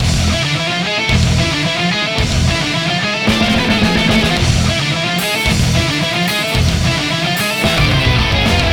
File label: Desert Boss Intro